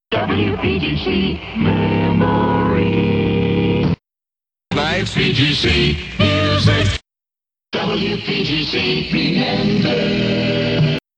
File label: jingles montage